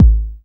Bassdrum Clean; Best Service; House Definition VOL-1

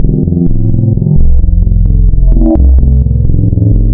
Spectral, wavetables; Architecture 32

